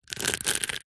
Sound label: household hairbrush plastic bristles finger run through; zapsplat